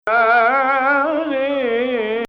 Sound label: Hijaz Octave